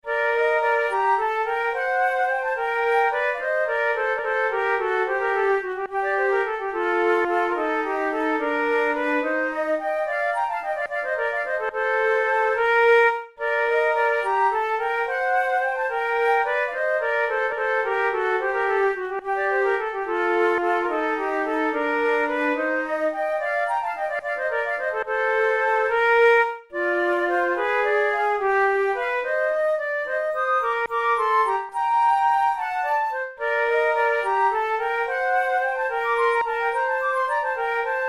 pour la flute duos andante; devienne; nouvelle methode

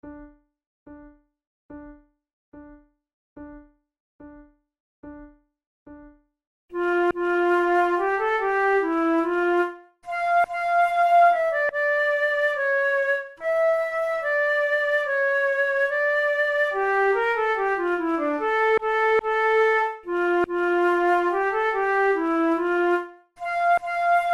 pour la flute duos andante; devienne; nouvelle methode

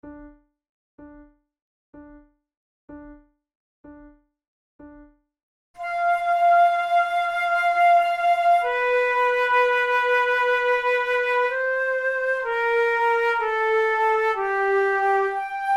flute sonata adagio; Mattheson; flute tunes

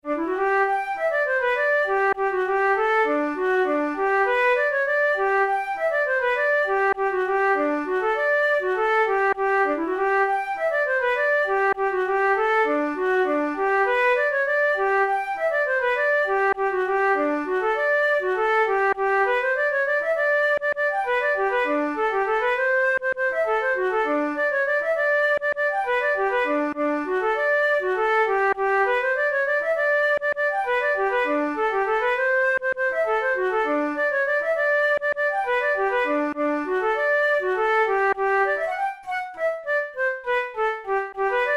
polka, flute; jenny bell

